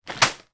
food bag of frozen vegetables drop on table

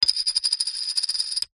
plastic disc small set down table spin; foley; zapsplat

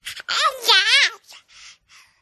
vox 10 month baby babble excited talking

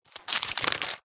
household magazine cheap page turn

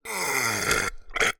human burp air unforced

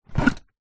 vehicles scooter moped seat lift up open

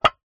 terracotta roof tile movement on top of other; foley; zapsplat

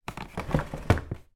household old sideboard drawer close creaky

